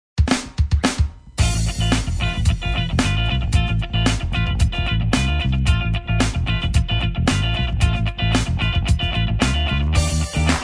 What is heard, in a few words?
jingle